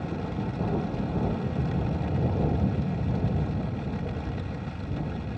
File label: Ambience Loop, outdoor